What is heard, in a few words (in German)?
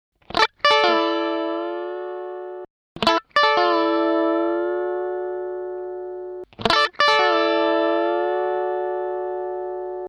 Comp Keel